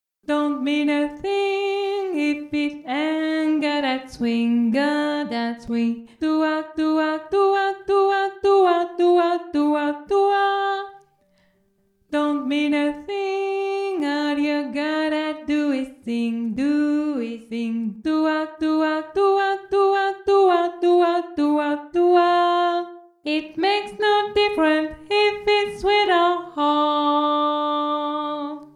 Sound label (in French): alto VOIX